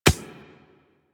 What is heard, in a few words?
Beach Ball Floof Bounce Dirt